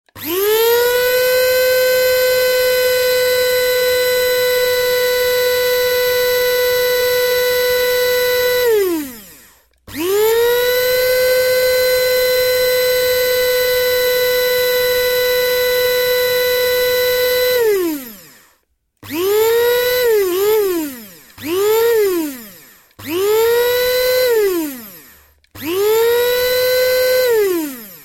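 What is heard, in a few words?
blender and juicer sounds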